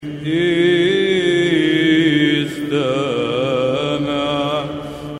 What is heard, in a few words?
Kekragarion, Arabic; DI flat